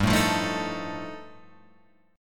G 7th Suspended 2nd Sharp 5th G, Guitar Open F; G7sus2#5 strummed

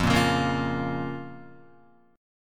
F Suspended 4th Sharp 5th F down Guitar; strummed; 4F,4A,5C,5G,5C,6E; JGuitar

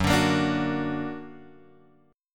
F sharp Augmented F sharp; F#+ Chord {2 X 0 3 3 2} strummed

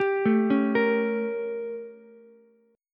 A 7th Flat 9th A, Ukulele; arpeggiated

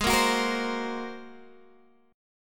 Bb 7th Suspended 2nd Sharp 5th Ab

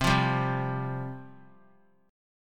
C Suspended 4th C; Csus4 strummed; 24,24,22,22; JGuitar

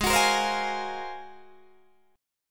Ab 13th down Guitar Open D

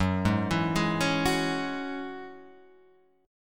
F sharp 7th Suspended 2nd F sharp down

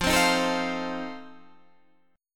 F 7th F down; strummed

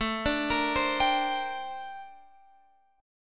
Bb Major 13th A

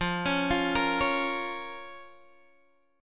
F Suspended 4th Sharp 5th, down, Guitar, Open G

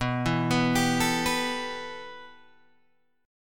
B 7th Suspended 4th Sharp 5th, Guitar, Open G

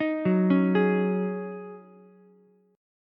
Eb Minor 11th Eb down Ukulele C6; Ebm11 arpeggiated; JGuitar

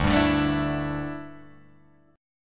D Suspended 2nd Suspended 4th, Guitar, Open G

